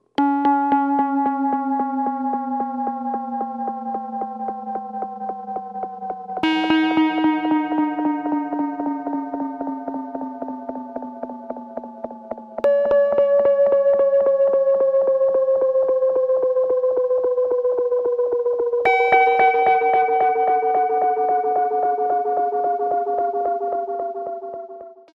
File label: delay feedback